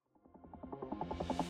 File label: mood intro triumphant